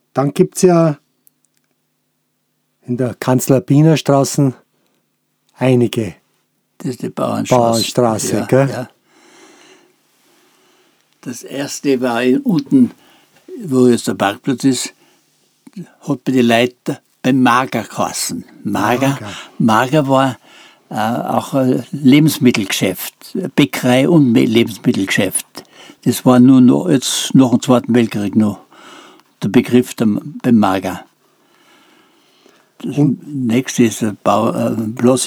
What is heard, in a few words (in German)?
Bassgeiger